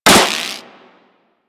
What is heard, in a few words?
Electro Mag Reload, Weapons; Warframe; Corpus